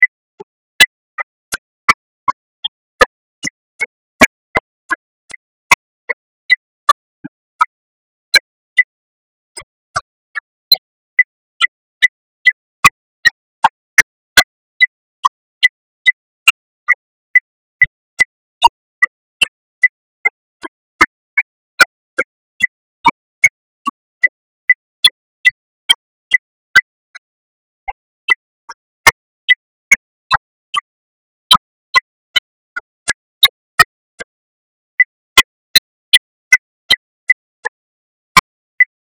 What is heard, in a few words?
130.00 BPM